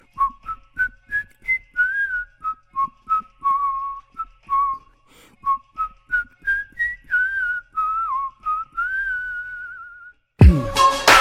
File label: Rap Whistling